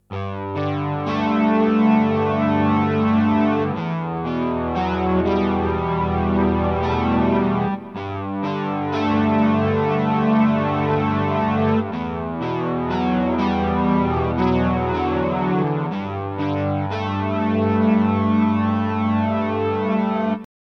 Synths

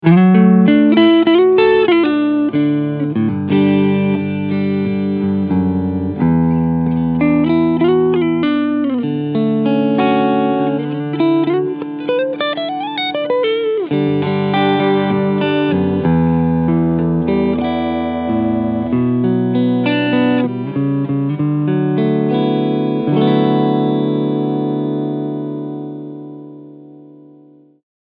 Framus Diablo II Supreme Neck Pickup Cleansound

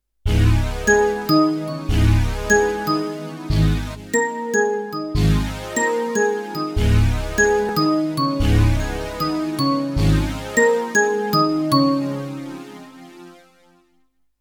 Octapad

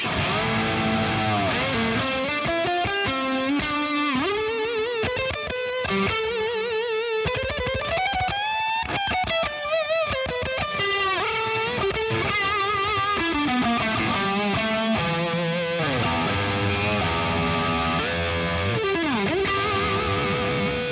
Headrush Core 6505 Fat Drive Lead

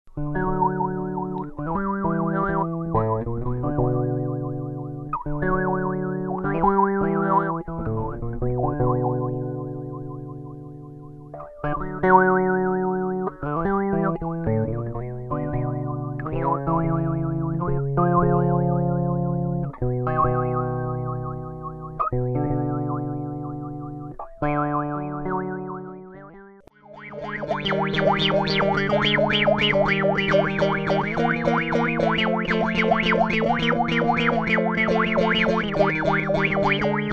Freaky Wah; EBS

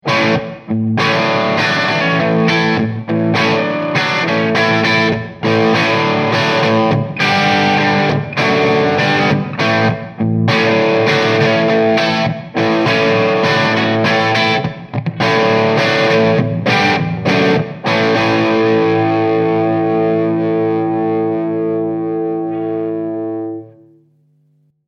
Hard Ambience

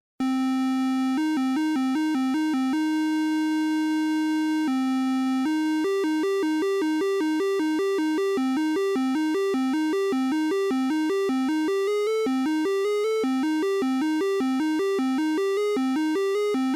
Waveshaper; MicroFreak

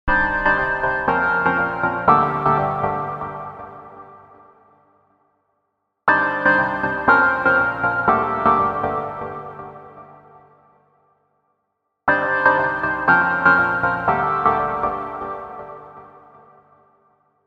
Chord Hits Verb

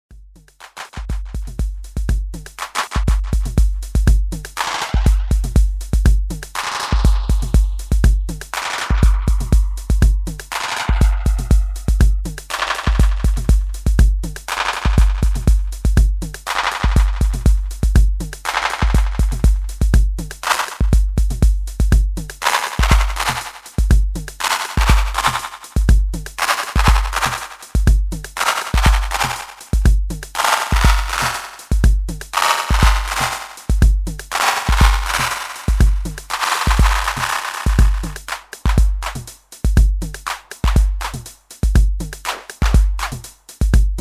TR8 Delay FX

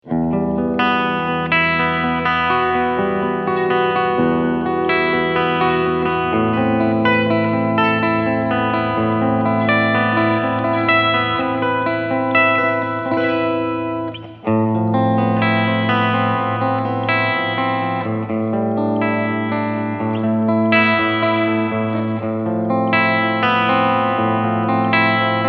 British Clean; Blackstar HT-20R MkII

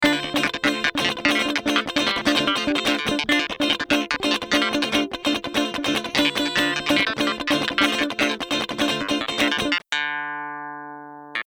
Guit Clav dry; Cameleon Labs 7721

